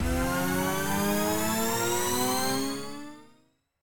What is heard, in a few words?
respin Snd, slots; king of slots